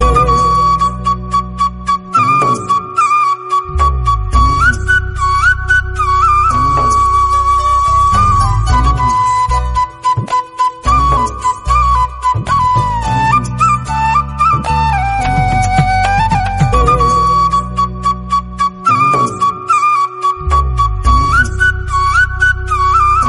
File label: most romantic flute